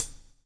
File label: BLUES TK, Backbeat; Spectrasonics - Backbeat